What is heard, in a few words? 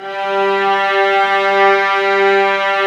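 violas, ambience, mezzo forte; String Master Series; Vlas Sect3-4; Amb mf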